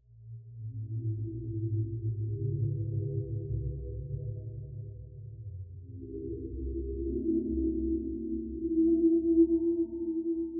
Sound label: reversed reversed ducks slow